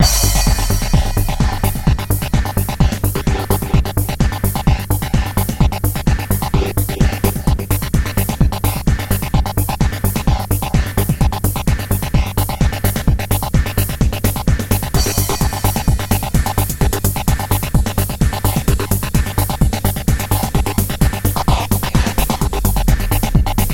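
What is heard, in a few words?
dancer 128.5 bpm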